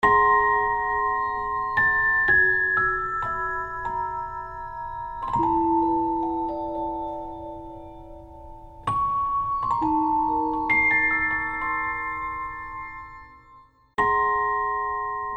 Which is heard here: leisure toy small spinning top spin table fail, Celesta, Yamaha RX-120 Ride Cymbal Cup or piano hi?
Celesta